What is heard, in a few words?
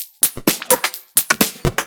Electro Loop 128BPM; Vengeance Samples